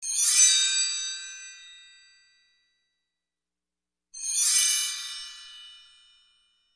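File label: magic magical sound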